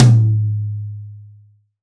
TOM, PIN STP TOM

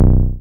TB C2; Best Service Gigapack 1; SYNTB303

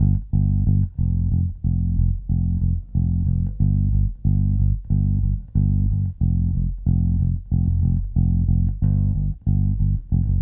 Call And Response Bass B, 70s Analog Funk; Sonic Foundry (Sony Creative Software); What it Is!

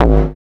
hard bass drumz

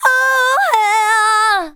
VOCALS SUNG; Techno Trance Essentials

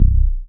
DM BAS, Dance Mega Synth; Best Service

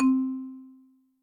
Marimba, Yamaha DTXpress

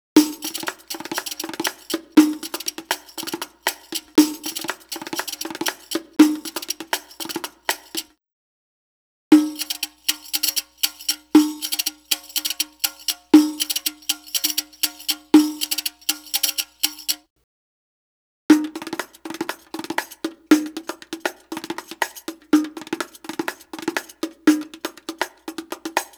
Arabic Rhythms

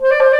THE CLARINET

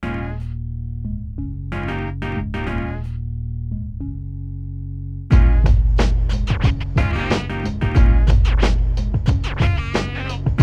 91BPM B, Hip Hop; InVision Interactive; 40 Oz Phat Slammin

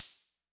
Hi Hats Open; Drums; LIMAT Div Dept

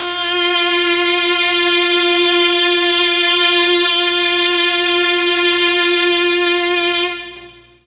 F2 3 violins, Mellotron MKII; Mike Pinder - Mellotron Archive CD

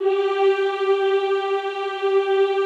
G3, Classical Choir; Best Service; Extended Classical Choir

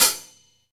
Rock Closed Hi Hat, Roland BK-5

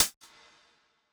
Closed Hat, retro house samples, Drum Hits

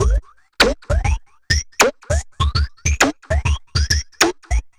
drloop, drumloops, Vinyl Attack; Masterbits

